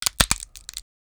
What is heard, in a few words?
ice creak gated; PonPonChun